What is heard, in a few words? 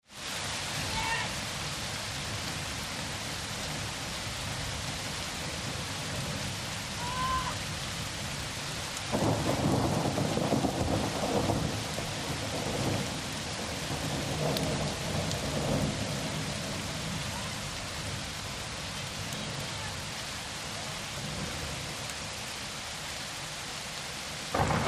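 Heavy Hail Storm Thunde, distant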